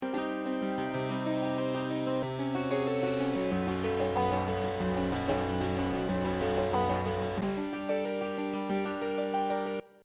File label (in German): FXM und Arper